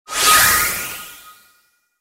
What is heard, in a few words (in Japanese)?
防御魔法; モノラル